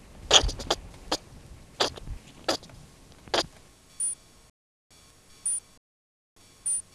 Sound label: Spermophilus suslicus grunt; speckled ground squirrel; The angry grunting and alarm calls